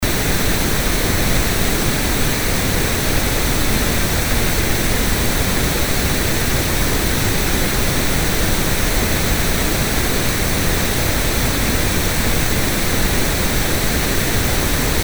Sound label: pink noise